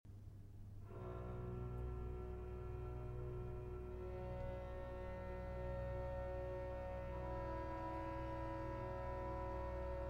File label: Flute Concerto In G Minor